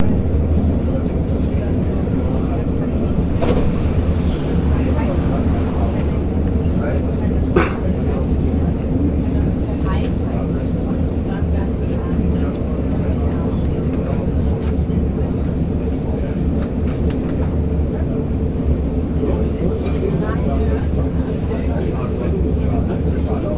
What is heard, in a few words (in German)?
U-Bahn Grundrauschen